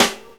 SD THIN, SNARES; E-Lab; X Static Goldmine 2

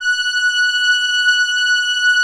Atmo Pads; Best Service; XX Large

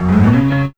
ETHNO

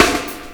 SNR

